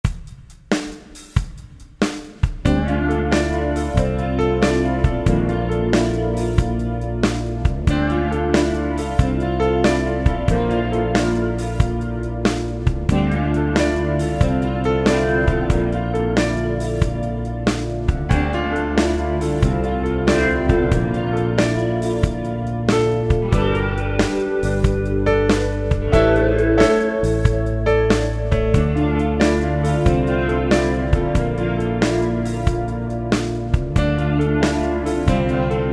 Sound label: Moody Country Rock